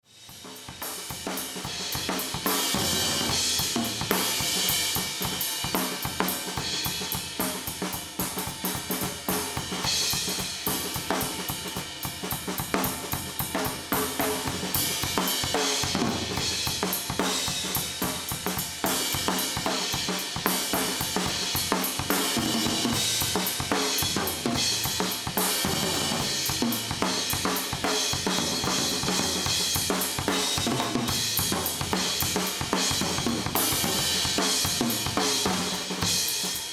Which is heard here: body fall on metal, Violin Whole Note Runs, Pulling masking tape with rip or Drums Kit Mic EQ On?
Drums Kit Mic EQ On